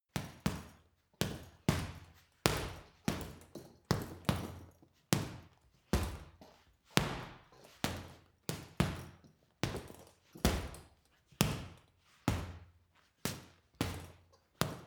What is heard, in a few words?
boxing punching bag boxing sequence; sport-freizeit; boxen